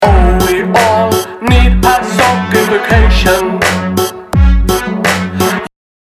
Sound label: Ringtone